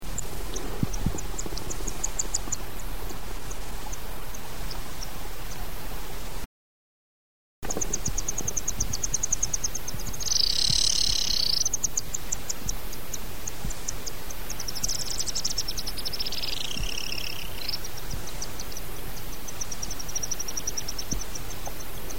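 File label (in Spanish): remolinera comun, aves; cantos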